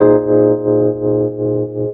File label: rho g#, rhodes; house; PS-28 Vocal House Samples